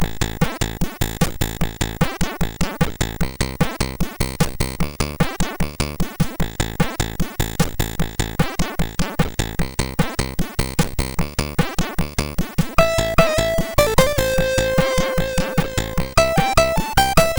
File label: sid, MOS6581R2; Total Refill